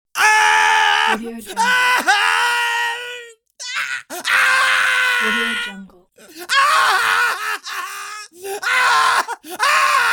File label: long screams from man in pain